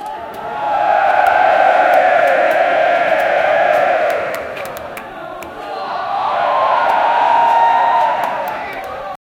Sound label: basketball crowd loudly, arena